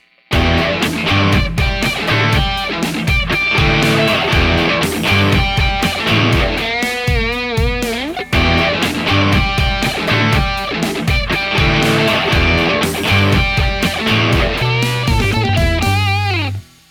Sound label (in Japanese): em rock rifu